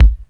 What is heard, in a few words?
Kick, Kickdrum; Equipped Music; Loungin House